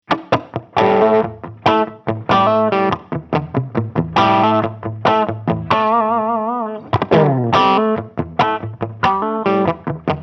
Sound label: Walrus Audio Silt Bypass